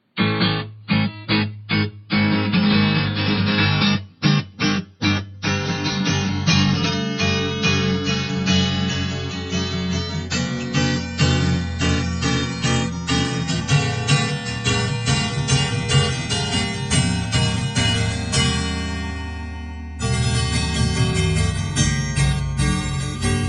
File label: inverted Guitar